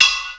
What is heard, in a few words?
CLANG HIT C5; Big Fish Audio; Synth City